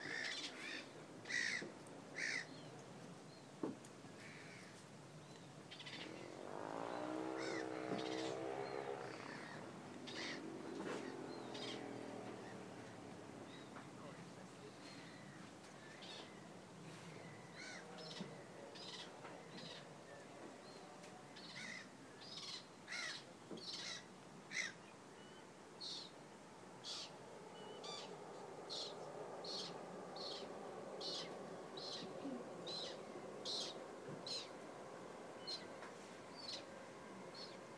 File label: Rye Meads nature reserve there's a speedway next door remarkable soundscape